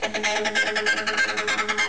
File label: flan, guitar